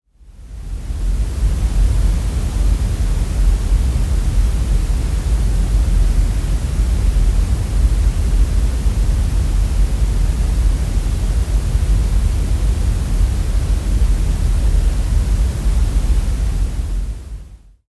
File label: noise gray